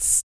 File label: VOC PERC, Vocal Planet Jazz FX BASS PERC